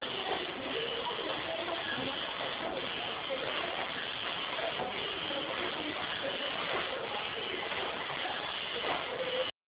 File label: low